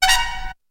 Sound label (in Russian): truba zvonkaya